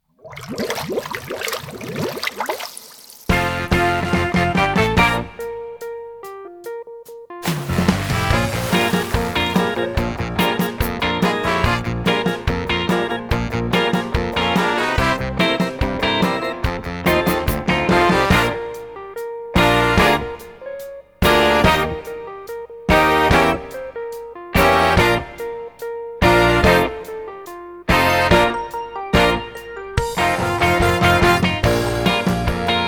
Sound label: Inst